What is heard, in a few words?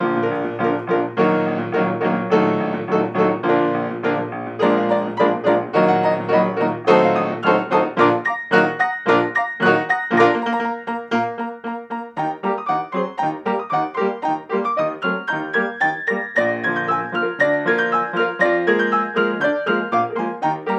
trio